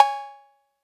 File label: Cowbell Electro